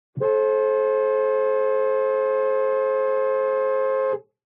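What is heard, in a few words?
SPORTS CAR Interior Horn Single Very Long; TRANSPORTATION; smartsound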